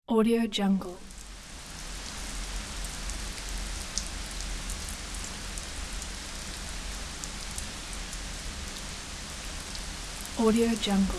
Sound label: light rain